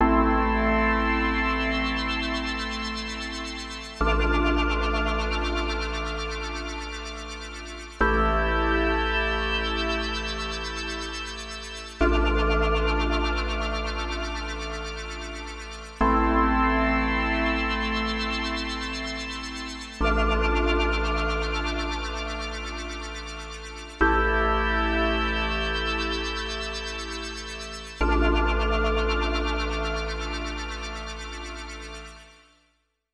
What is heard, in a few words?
Synth Pad